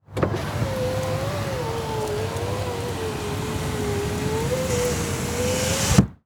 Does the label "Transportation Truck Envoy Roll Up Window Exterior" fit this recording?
Yes